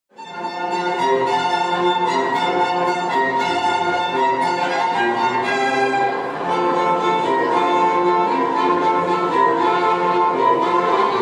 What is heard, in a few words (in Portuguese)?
QUARTETO DE CORDAS; OUTONO